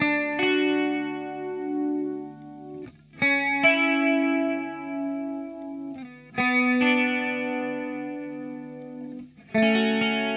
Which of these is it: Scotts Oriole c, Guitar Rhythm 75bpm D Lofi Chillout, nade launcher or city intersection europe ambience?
Guitar Rhythm 75bpm D Lofi Chillout